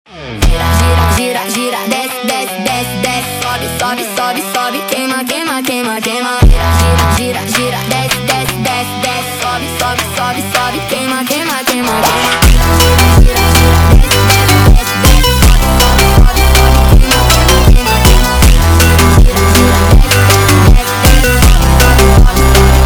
Montagem

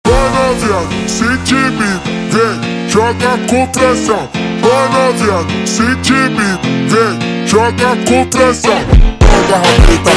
Montagem super Slowed

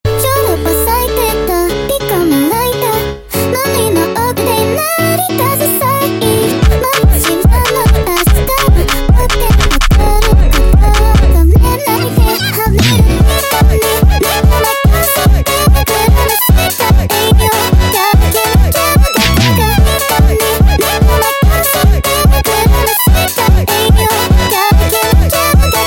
Montagem sped Up

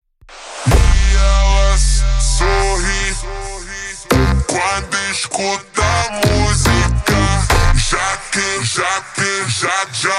Montagem ultra Slowed

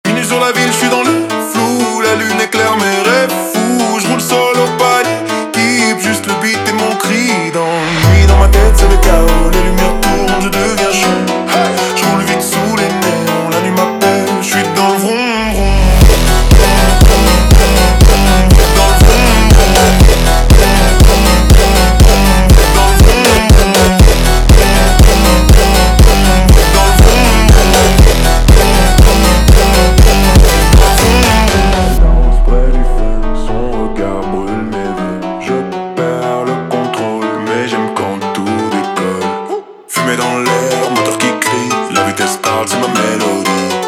Montagem slowed

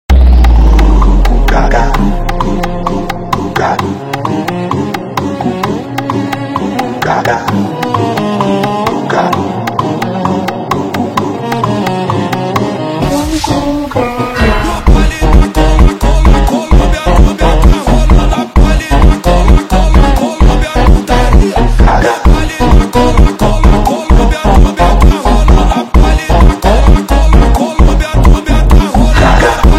FUNK

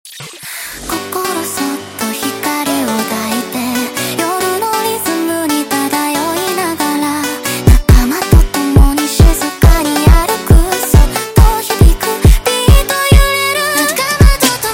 Montagem sped Up

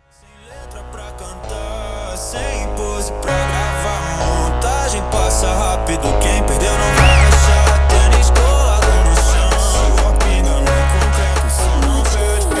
Montagem Jumpstyle

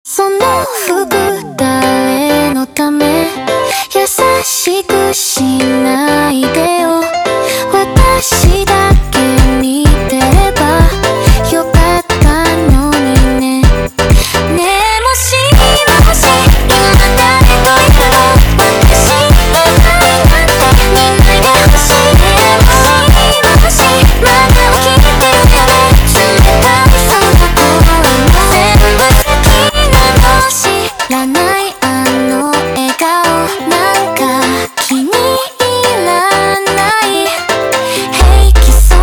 Montagem

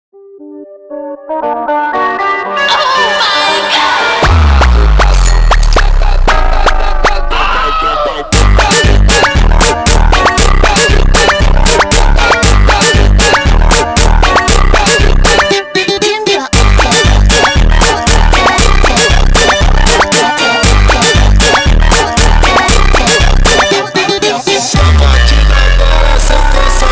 Montagem slowed

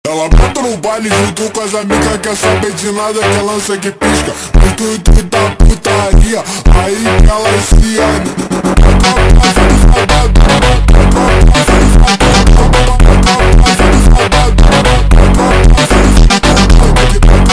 Montagem super Slowed

